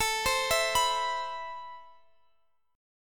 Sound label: C Major 7th, A, guitar chord; Cmaj7/A; arpeggiated